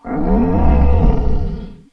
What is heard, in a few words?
roar